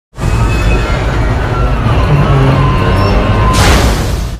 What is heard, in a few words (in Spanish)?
cerrando ascensor